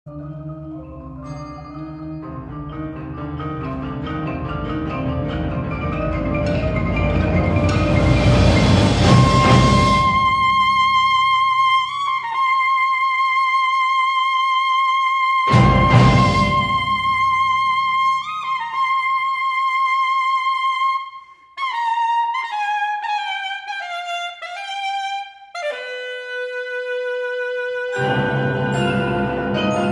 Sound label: sax